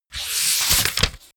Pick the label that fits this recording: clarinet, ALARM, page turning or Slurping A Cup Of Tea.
page turning